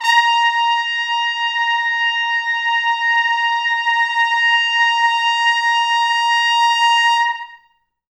A#4 -R, Pop Brass; Best Service; ProSamples vol.19; AKAI

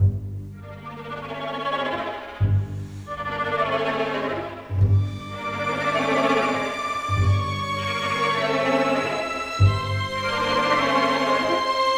Cinematic, Orchestral Series; Sonic Foundry (Sony Creative Software); Orchestral Series VOL-3; Cinematic 16

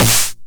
909 SD Tape Sat

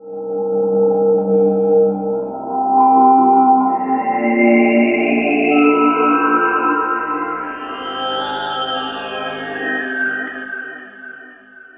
NRG Pad

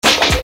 Snare Dr

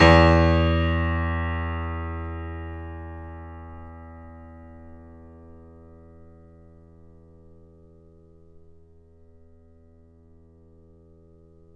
FF E 6 off, Grand Piano off mic; AKAI S6000 CD-ROM - Volume 2

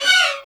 STRING LICKS, Disco; Best Service ProSamples vol.48 - Disco Fever; AKAI

